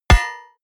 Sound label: hit metal hammer medium